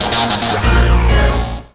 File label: goal; quake2; coop